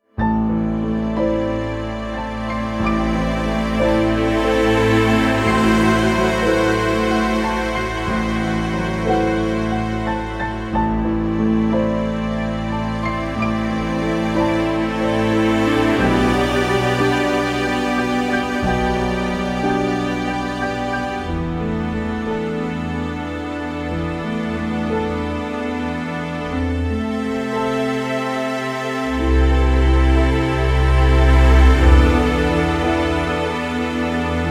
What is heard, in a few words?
Dramatic Cue